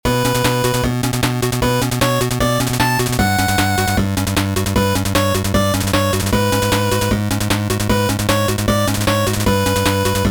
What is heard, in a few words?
Chiptune